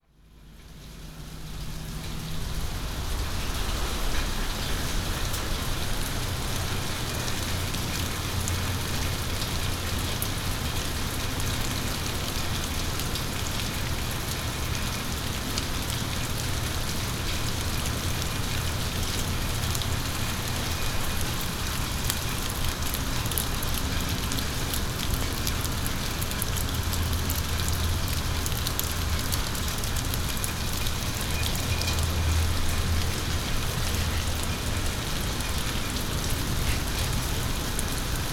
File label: Rain, binaural